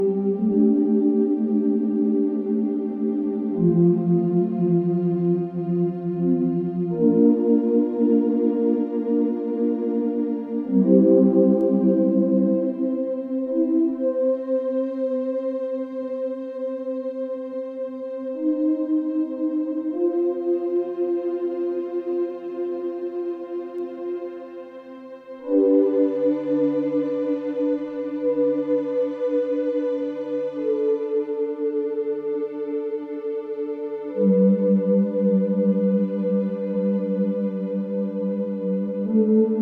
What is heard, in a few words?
BUSHWALK MUSIC excerpt